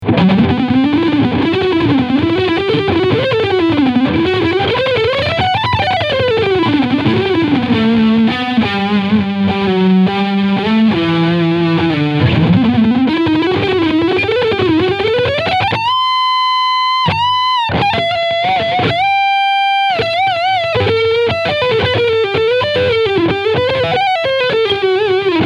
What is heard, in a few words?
Leadsound Mid Out